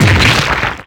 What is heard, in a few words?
hit; MonsterHunter; Fight